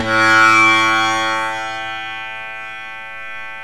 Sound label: TAM SIT SAR